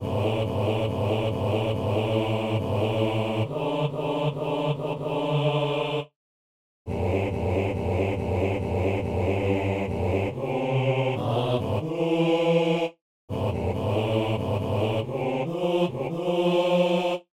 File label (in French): pain pour l homme, basse